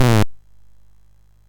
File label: MOS6581R2, GAMES; Sammy Lightfoot